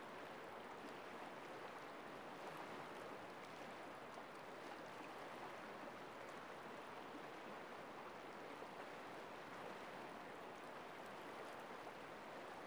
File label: flat rock on water, small waves